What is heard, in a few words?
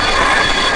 grind; Matt Hoffman's Pro BMX 2; XBOX